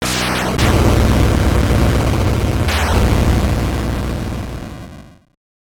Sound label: Fortress Explodes; nes; Air Fortress